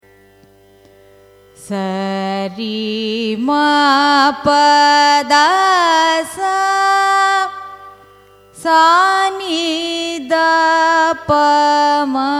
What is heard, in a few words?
raga saraswathi arohanam avarohanam, carnatic music